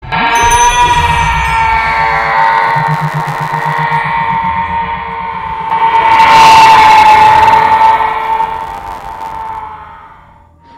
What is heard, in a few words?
ahhhhhh